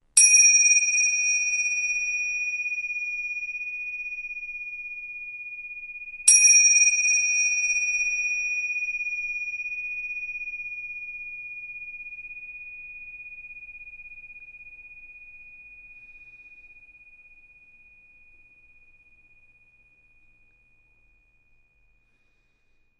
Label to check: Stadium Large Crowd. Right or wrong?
Wrong